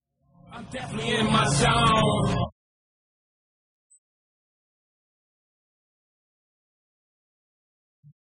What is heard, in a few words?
win; redsun; items